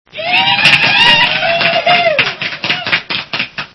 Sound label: yeah-hoo; mIRC